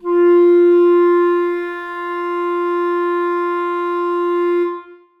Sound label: clarinets sus f4; Sonatina Symphonic Orchestra